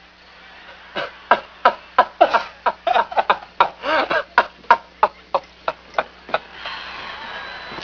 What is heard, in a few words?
laugh